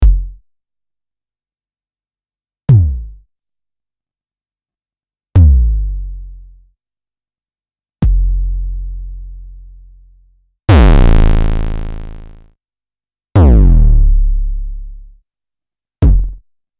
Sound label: Hexinverter Mutant BD9 Bassdrums